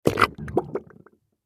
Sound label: Mud Suction, Mud Suction Liquid Gurgle Thick Submerge; Mud Suction Liquid Gurgle Thick Submerge Bubbles; Foley; Blastwave